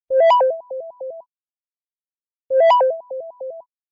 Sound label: nokia echo sms ringtone